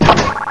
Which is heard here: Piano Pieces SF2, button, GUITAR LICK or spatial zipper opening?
button